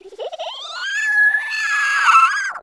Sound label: Inhale; Mother Maiamai inhaling; zelda